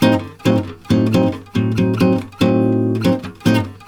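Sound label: A DIM guitar, Brazil Bossa; USB Soundscan; AKAI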